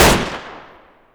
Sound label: c96 shoot